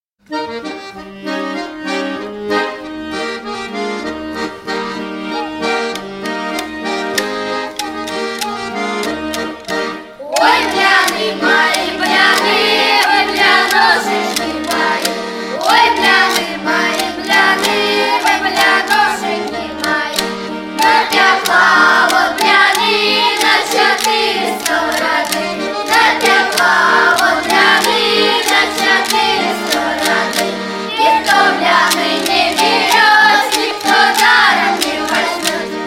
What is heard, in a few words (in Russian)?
blyany ansambl tseremonochki pesnya